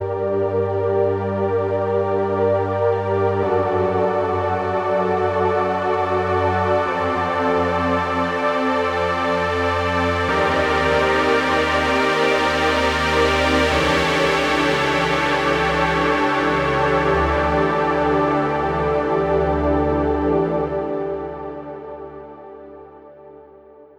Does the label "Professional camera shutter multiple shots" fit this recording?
No